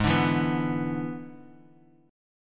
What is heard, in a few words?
E 7th Ab, guitar